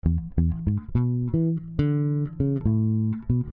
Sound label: Ska Bass; Loopology; Ska Till Ya Drah; Lounge & Reggae